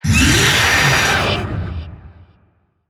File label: Vocal Pain; Warframe; Zariman; Void Angel